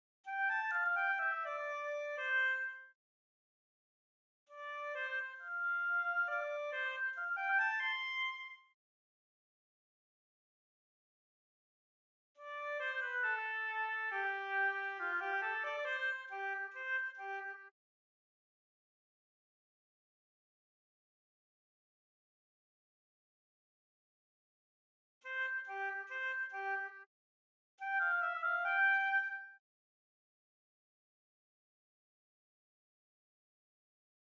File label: Oboe; Gnome forest